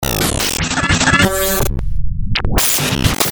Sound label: Leg Rip, Stabs & Bursts; Best Of N2O Library